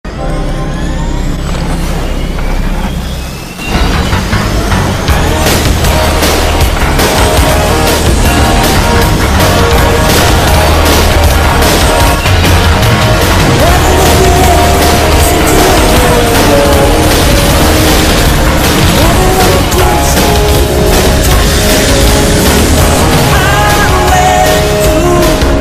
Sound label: airplane; nighthawk; audio meme